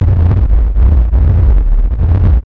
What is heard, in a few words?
SYN-FX